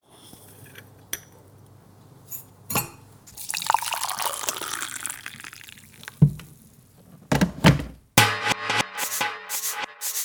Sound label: Kitchen Drumkit mixed